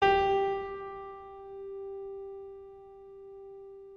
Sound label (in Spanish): sol, musical notes; websim